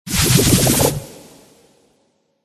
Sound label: mage female buff out